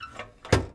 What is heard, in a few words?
Vehicle Hatches; Red Orchestra 41-45